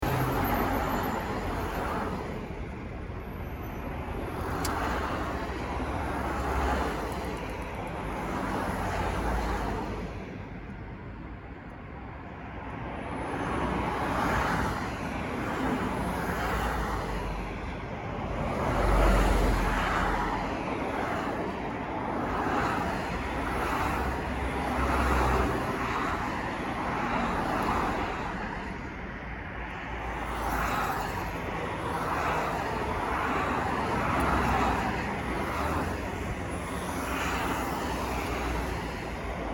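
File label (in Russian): proezd mashyn, cars passing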